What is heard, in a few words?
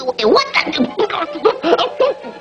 DROWN; porkypig